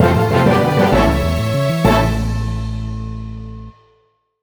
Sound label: WinBattle